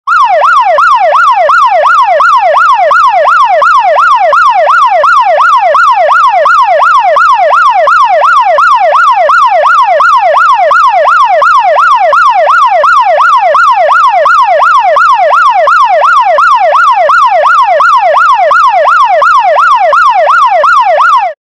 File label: police siren sound effect